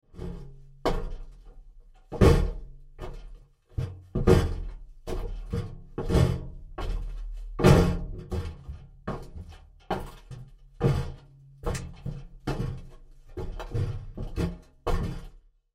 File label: Ventilation Shaft Crawl Through; Miscellaneous Human; Foley; Blastwave